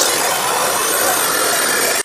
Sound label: hack spectre reprogram tones; dataknife; Titanfall 2